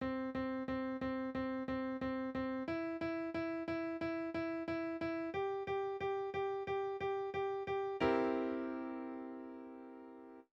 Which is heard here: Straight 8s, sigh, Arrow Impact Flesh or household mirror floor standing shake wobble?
Straight 8s